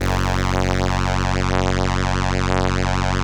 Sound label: ELKA, String Machines; ELKA RHAP